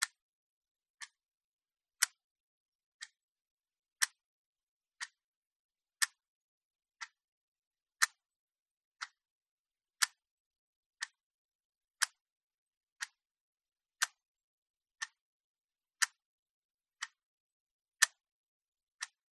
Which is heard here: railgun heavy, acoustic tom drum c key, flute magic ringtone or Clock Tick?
Clock Tick